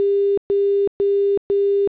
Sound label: busy